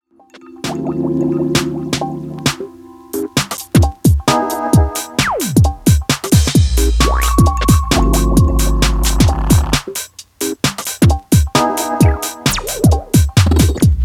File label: SCRATCH